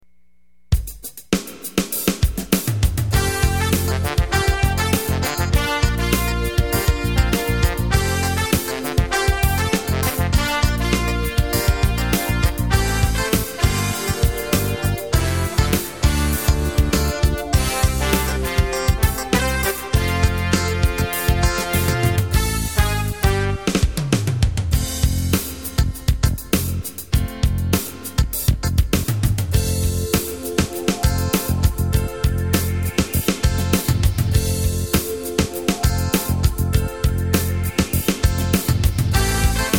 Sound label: pop; yamaha